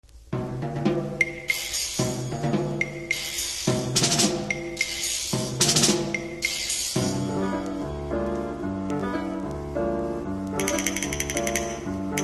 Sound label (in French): Percussions; L espagnolade; Pierre Gabaye